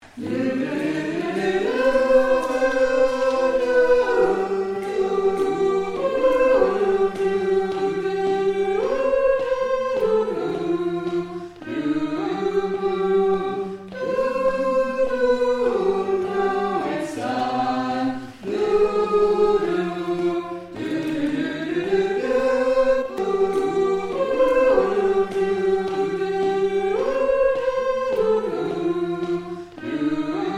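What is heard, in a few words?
soprano, singers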